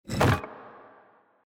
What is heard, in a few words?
reel Spin stone Land; Champions of Valhalla